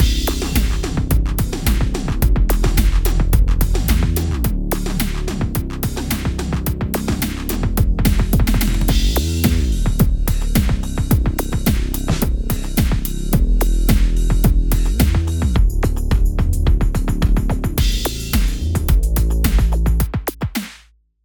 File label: Game music; Enihree G